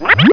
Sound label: bloop